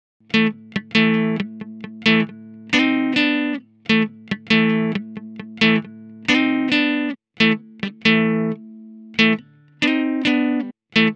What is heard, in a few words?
Country Rock in A 135 BPM